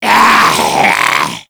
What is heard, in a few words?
Mariner Zombie Attack, Vocals; Grineer Mariner; Warframe